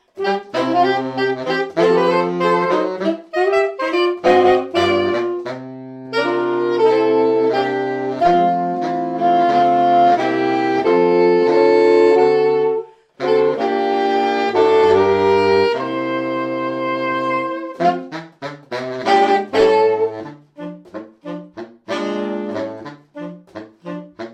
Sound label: Santa's christmas Sax, Saxophonquartett; Weihnachtsprogramm; swingiges Weihnachtsprogramm